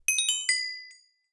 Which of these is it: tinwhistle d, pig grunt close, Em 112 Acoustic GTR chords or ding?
ding